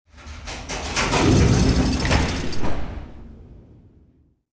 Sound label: SP DOOR, door Seaport; PC Gamer IT CD 40; zones